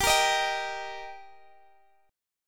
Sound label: D sharp Major